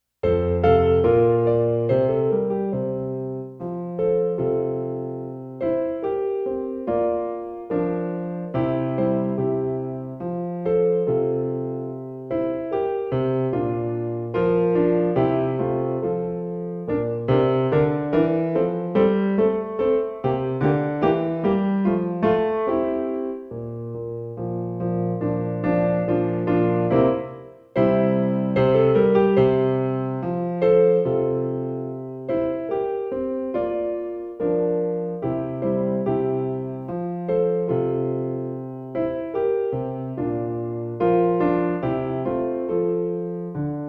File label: We Are Music Accompaniment; Music in Our Schools Month